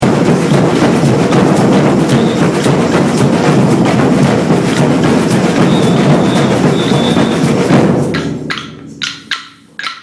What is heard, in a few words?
Swing Do Pelo Break 3